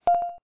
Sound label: Ding; allwinner v851s3; fastboot sl100 front; busybox init base files